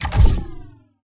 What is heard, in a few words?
Heartball Reset